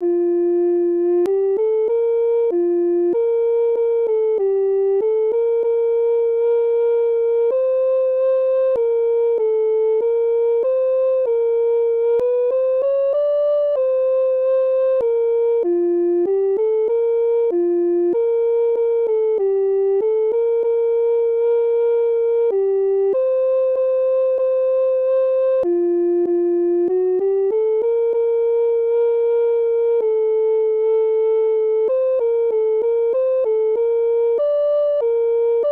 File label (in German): Tenor